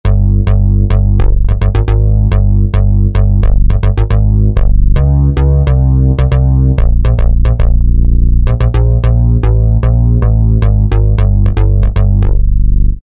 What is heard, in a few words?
Bass Grindge, E-mu Morpheus; Factory Presets